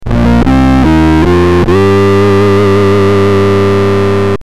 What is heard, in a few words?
oct fuzz